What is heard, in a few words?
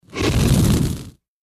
Fire Breath Dragon